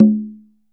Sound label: CONGA MD OP